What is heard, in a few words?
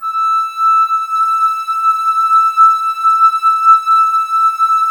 Flute, Woodwind